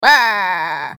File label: die; freak fortress 2; oddworld; munch